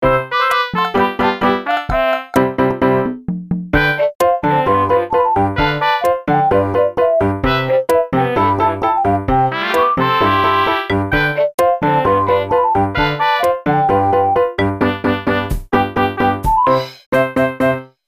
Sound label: ring cha cha